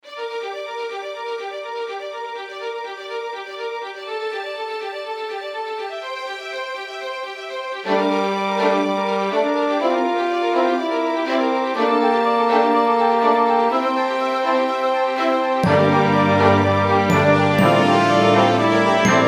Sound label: Fanfare